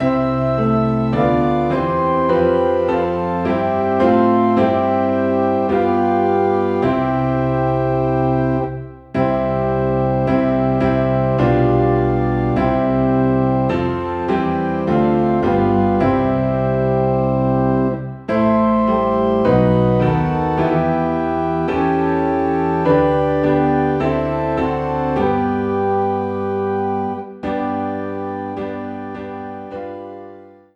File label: Piano and Organ in C